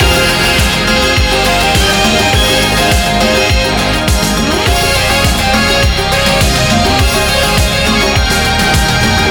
hotline big Win loop; netent

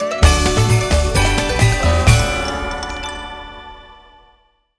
medium Win; netent

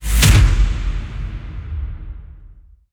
big win text; netent

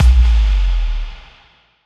impact; netent

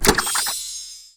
Card Pick; netent

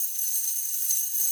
reel Spin; netent

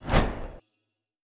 multiplier Jump; netent

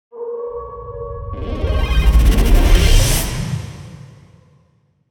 big win ramp up

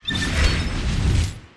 sticky wild

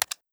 button Click

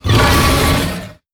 boss Hit Heavy; netent

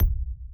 reel Bounce; netent

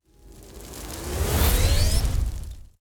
Big Win Ready; fruitblaze; netent

